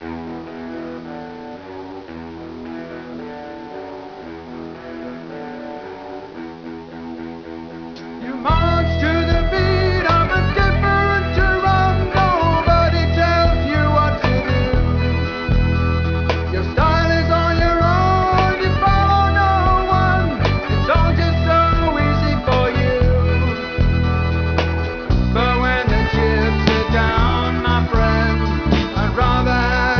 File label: drum